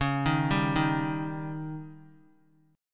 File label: C sharp Suspended 2nd Flat 5th C sharp; arpeggiated; JGuitar